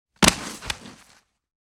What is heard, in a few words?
Heavy Body Fall with Hand; Fairlight Sound Lib